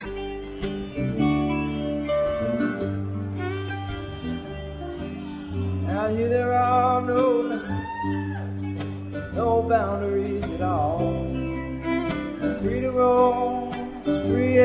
Strings Breath

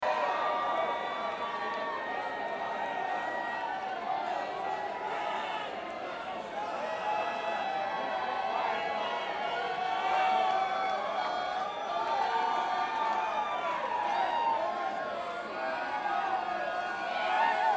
Crowd

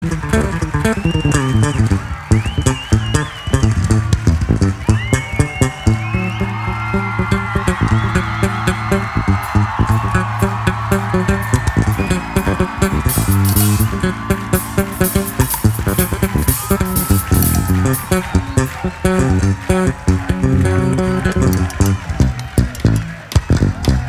Bass Jam

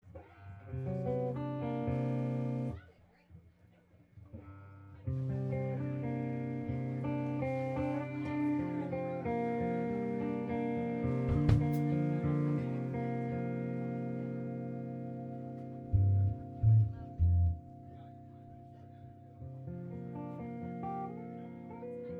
tuning

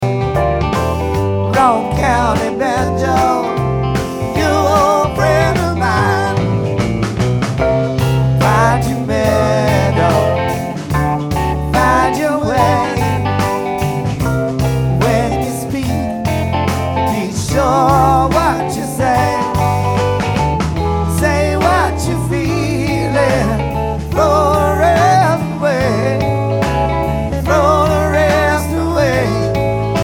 Banjo

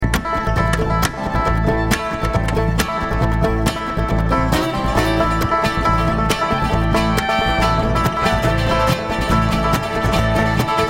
Traffic Jam

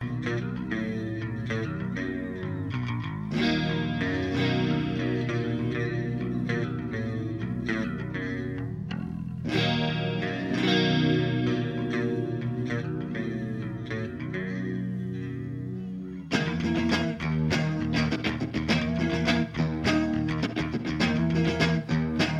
Bass Guitar Doodle

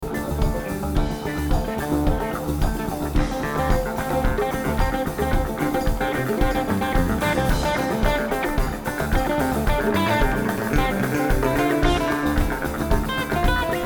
Drums and Bass

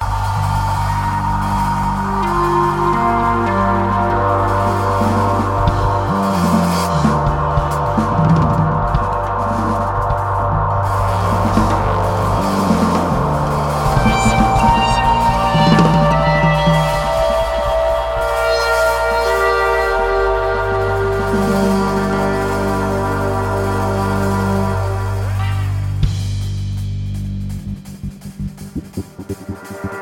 Synth Improvisation

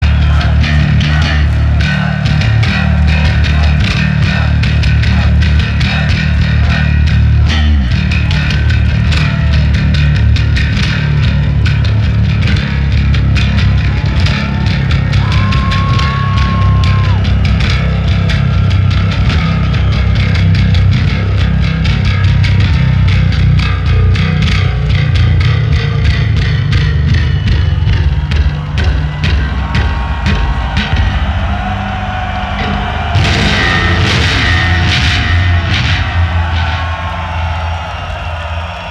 Bass Solo

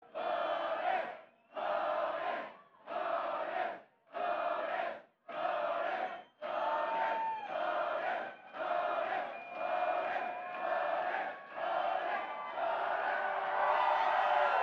Crowd Banter

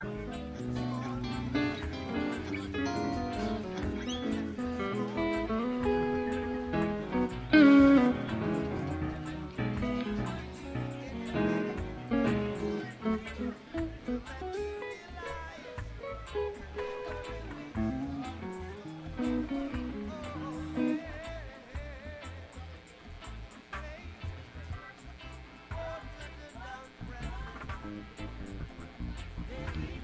tuning

